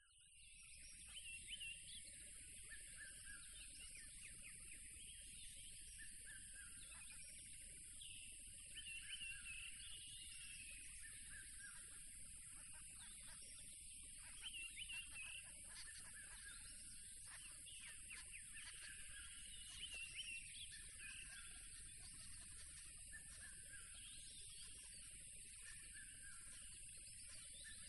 ATMO NATURE Tropical River Loud Crickets; smartsound

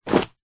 foley clothing denim jeans drop carpet floor

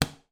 household trash bin lid plastic close small

